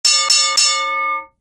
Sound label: box